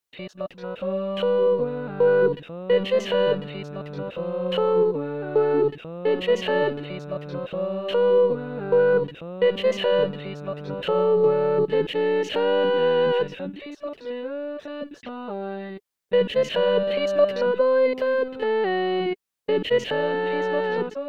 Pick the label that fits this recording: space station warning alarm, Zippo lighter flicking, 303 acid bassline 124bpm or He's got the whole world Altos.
He's got the whole world Altos